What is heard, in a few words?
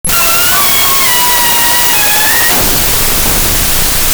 Door Wood Squeak; 1001 Sound Effects; Foley